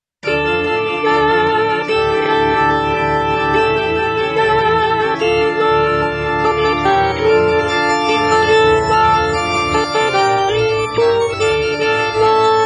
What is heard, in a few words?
alto